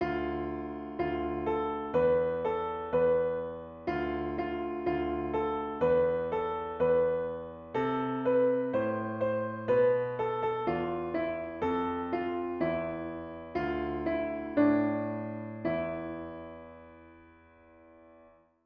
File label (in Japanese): ピアノ